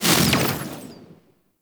Proj Explosion; Warframe; Noctua; Weapons

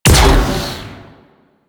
Alt Shot, Weapons; Warframe; Corpus; UMP; Tetra

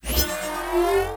Alt Charge, pistol; Crp BE Plinx; Blastor Pistol

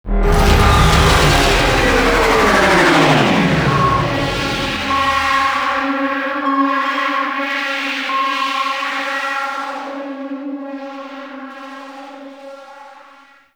Intro Carrion Launch; Intro Battlefield

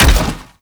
Step Default, Foley; Warframe; Sentients; Erra

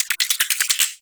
Tiny Creatures; Ambience; Warframe; Corpus Railjack

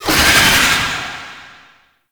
Summon Vocal Verb; Warframe; Uriel; Gulphagor

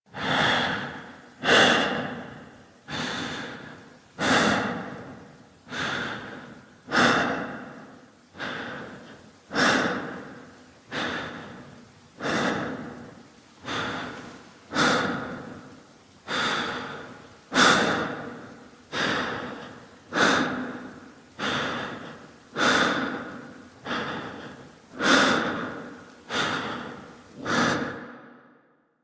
Breathe Walk Loop; Warframe; Entrati Lab Quest; 2023 TennoCon; Arthur

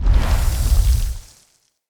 Healing Totem Cast; Fey; Ward

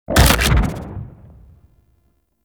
Shotgun Alt Shot; Weapons; Warframe; Tenno; Revenant Shotgun

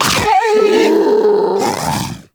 Predator Vocal Death; Warframe; Hunting Critters; Characters

